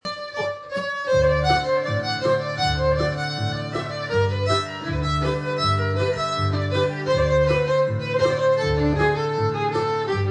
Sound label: black and white rag, Texas Fiddle, Double Stops